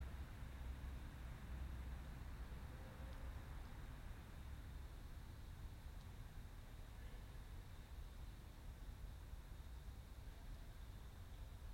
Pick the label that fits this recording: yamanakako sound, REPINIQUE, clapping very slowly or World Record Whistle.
yamanakako sound